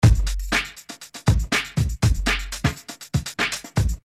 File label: DRUMS